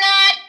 vocal

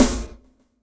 dance snare

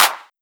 Clap; osu

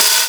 OPEN HI HAT B2

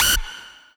lead

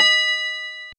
Bell D#6

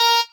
Lead

